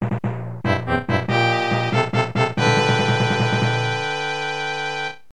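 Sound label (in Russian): звук бонусного уровня в игре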